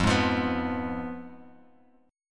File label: F Diminished Guitar Open G